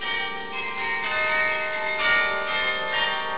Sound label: carillons, bell; nieuwpoort